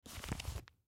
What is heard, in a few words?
Magazine Pick Up; Magazine Pick Up Carpet; Paper Sound Effects; Materials; Foley